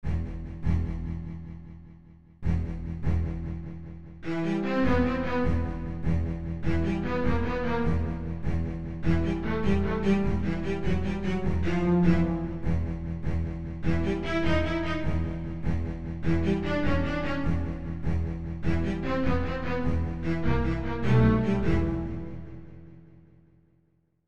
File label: Bowed Strings; Salti